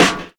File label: good snare f sharp key, steel snare drum sound tuned to the F# note